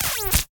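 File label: laser misc beam transient, science weapons; Sonniss GDC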